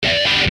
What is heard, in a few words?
Punk insert; Nokia; Audio themes